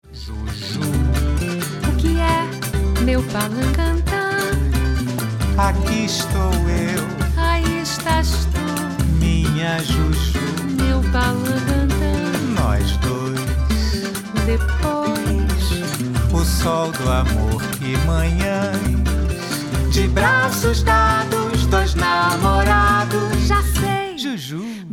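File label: jazz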